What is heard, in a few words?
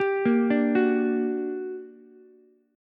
G Minor Major 7th G, Ukulele; arpeggiated; JGuitar